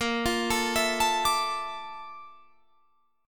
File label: A sharp Major Sharp 11th, Guitar, Standard; A#M#11; arpeggiated; JGuitar